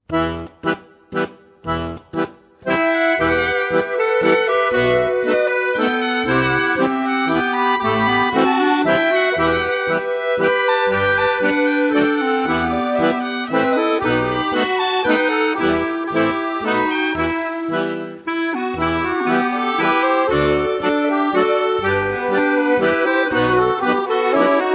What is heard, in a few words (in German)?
Finnland, Accordion; Kristallen den fina